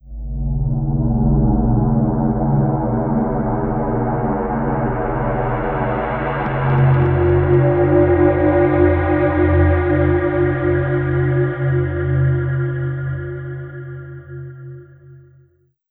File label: Bell Atmosphere; Sonic Foundry (Sony Creative Software); Slow Silhouette